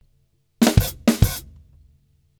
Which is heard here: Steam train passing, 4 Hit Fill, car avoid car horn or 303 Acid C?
4 Hit Fill